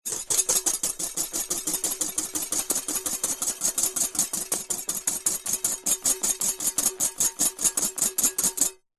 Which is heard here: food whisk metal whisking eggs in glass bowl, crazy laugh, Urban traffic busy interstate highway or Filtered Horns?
food whisk metal whisking eggs in glass bowl